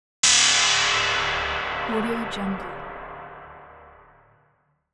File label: impact